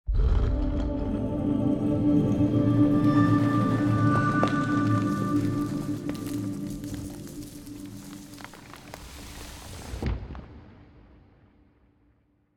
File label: Jingles; Monument Valley 2